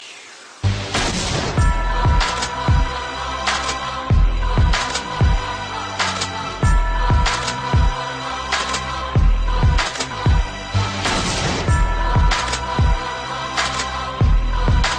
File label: gangsta beat